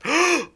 guy mouth

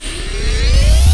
powerup; thexder

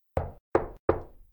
small Door Knock; Keepsake Demo

